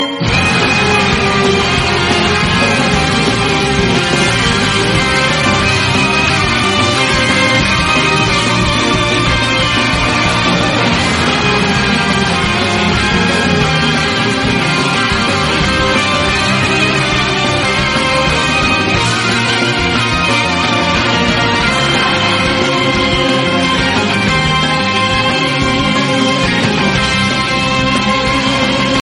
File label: anime intro ringtone; dmc; english ringtones